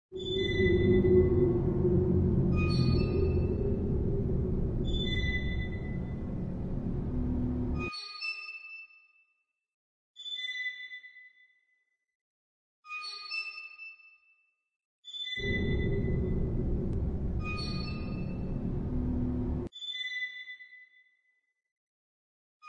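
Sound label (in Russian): creak of a scary swing; Звук качелей раскачивающихся на ветру, металлических, на цепях или веревочных, закрепленны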